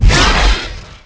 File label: Ship Laser Shield